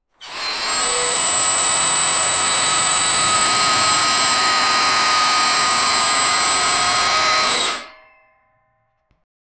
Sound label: sounds of renovation, wall